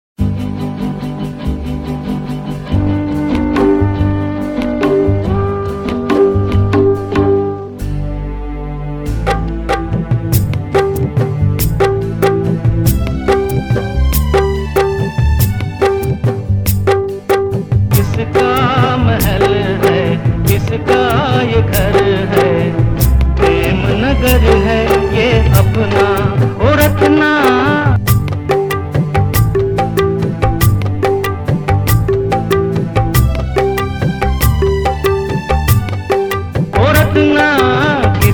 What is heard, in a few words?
Karaoke Male